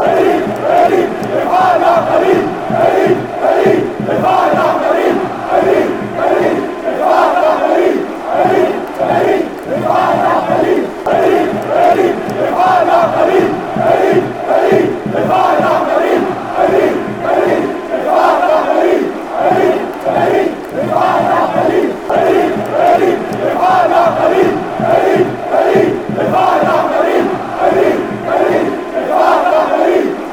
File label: berlin, fan chants